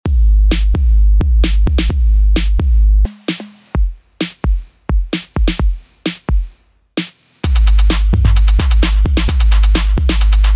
Lo-Fi Drum Machines

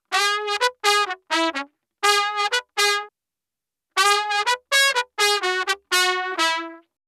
124 trumpet loop cheery Emaj

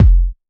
kick well rounded

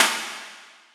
drum one shot snare clap

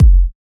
Kick

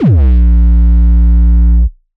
Bass Hard

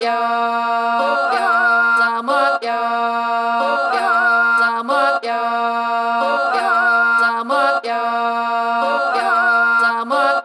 Loop 92 Wet A

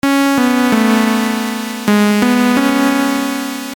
DRUMS SD

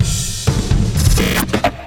Drum Fills, 128 BPM

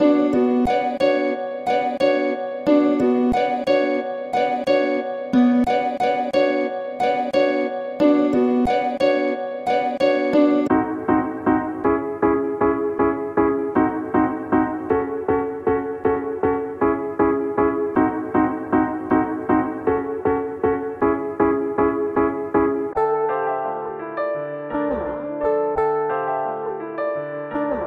keys; Melodic Kings

